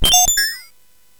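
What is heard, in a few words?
sfx, MOS 8580; hvsc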